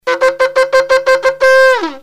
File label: teruah, shofar sounds; yeshua; feasts